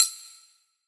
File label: Kitchen Foley FX Drums; Antidote Advent; Kitchen Foley Sounds & Loops